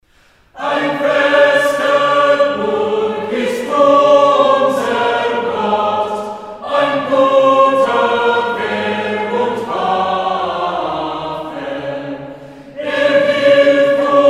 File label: chorale; Martin Luther; Ein feste Burg